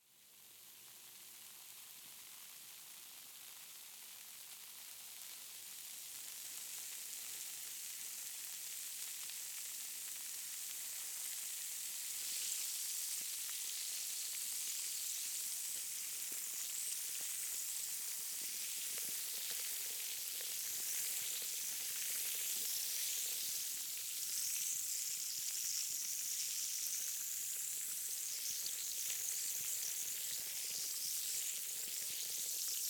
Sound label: Fizzing Bubbling Bicarbonate Of Soda Baking Powder